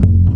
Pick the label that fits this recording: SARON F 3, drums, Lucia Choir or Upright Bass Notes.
Upright Bass Notes